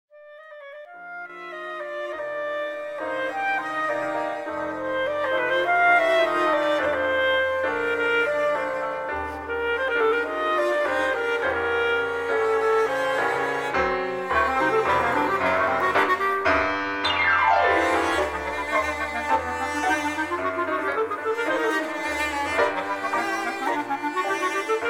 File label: Tango Cafe, ringtones